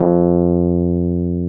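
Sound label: Fender key bass F2; Sonic Reality; Vintage Timetraveler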